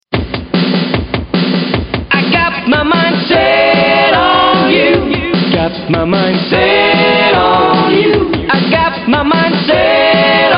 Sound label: SATB